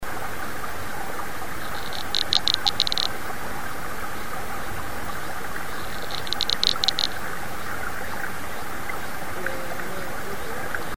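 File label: Gyrinid Beetle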